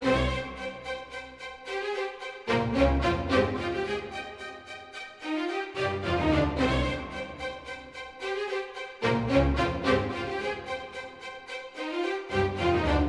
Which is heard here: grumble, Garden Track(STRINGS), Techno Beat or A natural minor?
Garden Track(STRINGS)